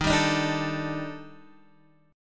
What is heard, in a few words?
Eb Major Sharp 11th, down, Guitar, DADGAD